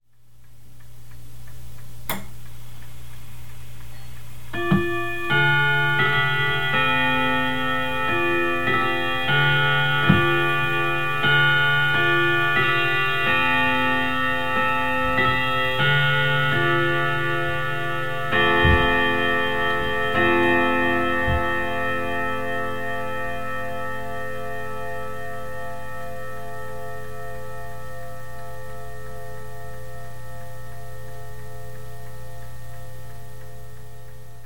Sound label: Clock Serviced